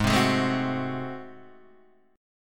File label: Ab 7th Flat 9th Guitar Open D, chord